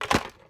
ui drop plastic junk; Rust

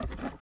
ui pickup wood; Rust

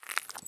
turn glass; Escape from Tarkov

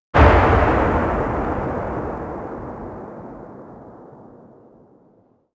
explosion mid tail; World of Tanks Blitz

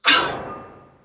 pain grunt, Human Pilots; Titanfall 2

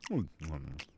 Eat Vox; Civ4 warlords

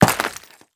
foot glass dirt, footsteps; Call of Duty Modern Warfare

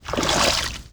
water walk medium

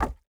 phys impact wood; Rust

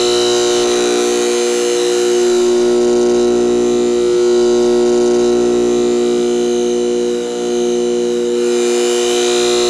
light fluorescent hum

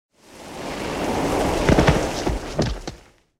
tanks explosion ground; War Thunder

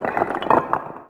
office occasional

